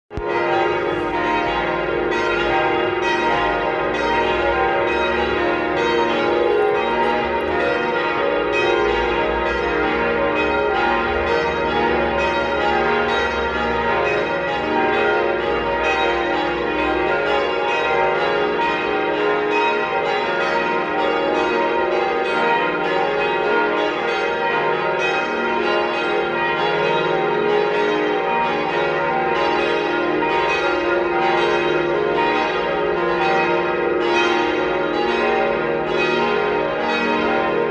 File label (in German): Kirchenglocken